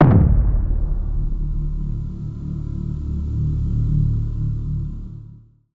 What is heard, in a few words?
Impact Boom Tonal; bottlerocket